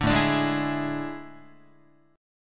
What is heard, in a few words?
A Major 7th Suspended 4th, Guitar; AM7sus4; strummed